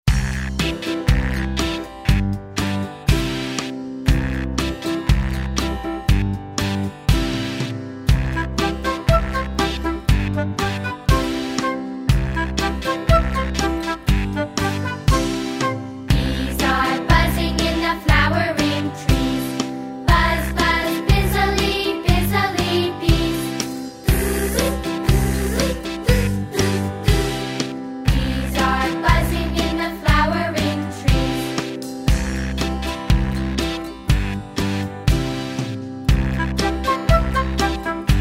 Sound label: bees are buzzing